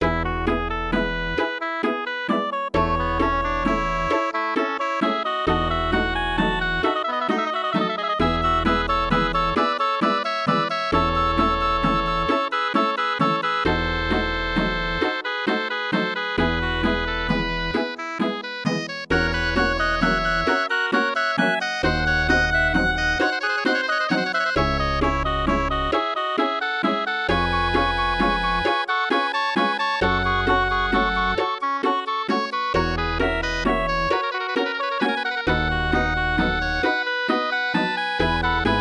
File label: MIDI